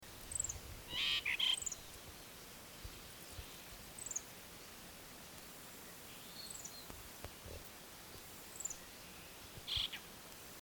jalak brahmana calling

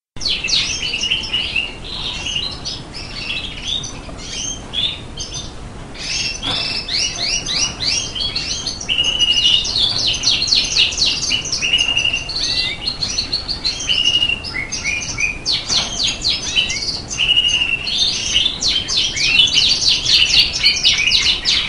kenari border

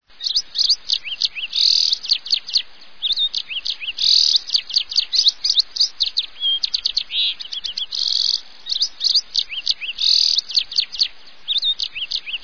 kenari brimstone

